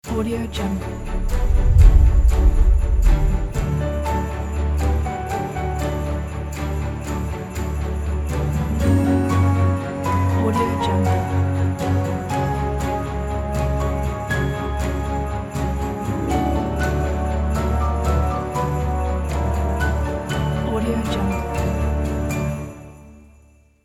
christmas orchestral folk dance

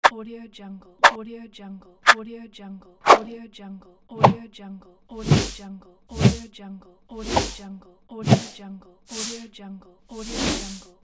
swooshes

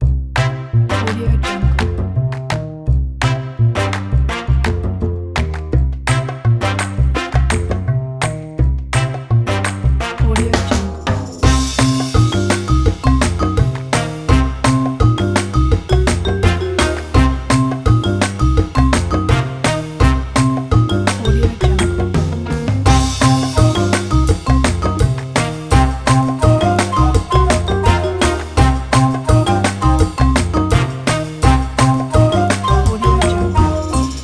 fun quirky and retro; joysound

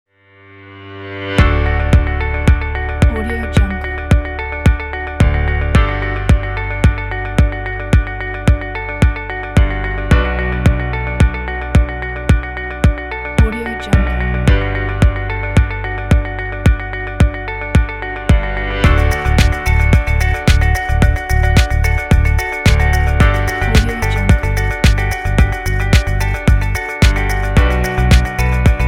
corporate business music pack

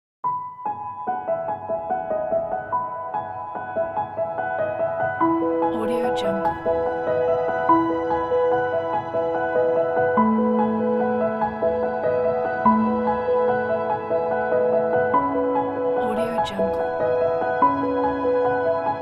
relaxing piano

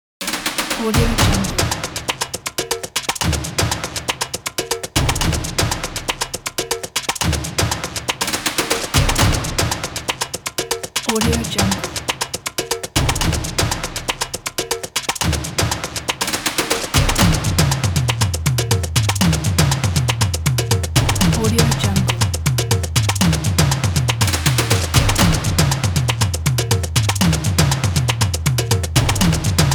football; artsound

